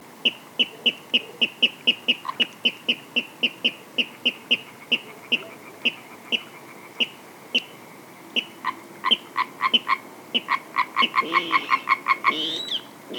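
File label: pastushok golos, water rail voice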